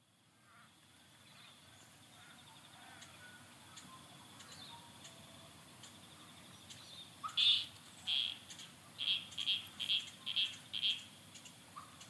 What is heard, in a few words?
dawn; Chewton